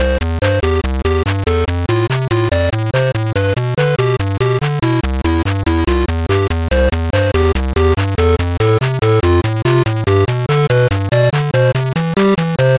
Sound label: video game music level